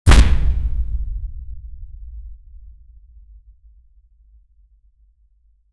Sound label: a heavy contained thump